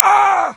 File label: wound, stalker